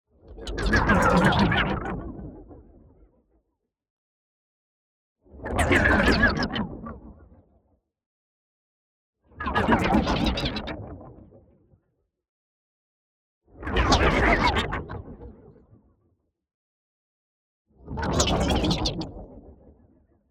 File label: NEON WHOOSH SMALL SLOW Dizzy Spinner; boom library